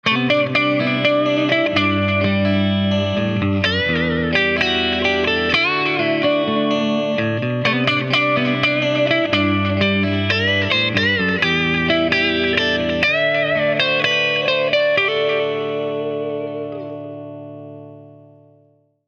Fryette Pittbull UL Clean Channel Country Chime

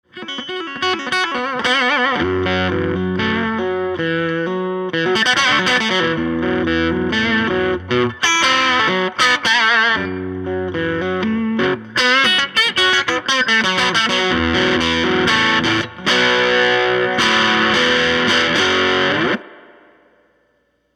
Fuzz; Hartman Tommy Bolin Fuzz

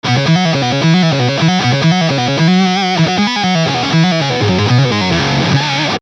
Foxrox Octron 2 Synth Octron

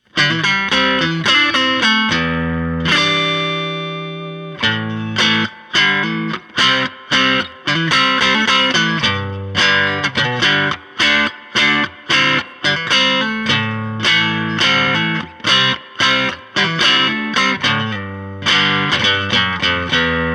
Fuzz; Hartman Tommy Bolin Fuzz

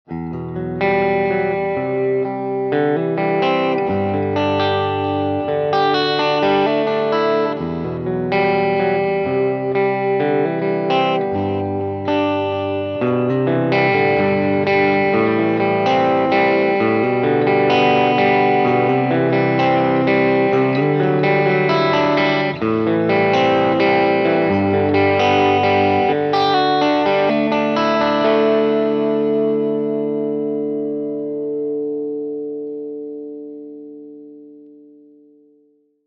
Welagen ODS Clean FET Bright 2 Deep Rock Delay

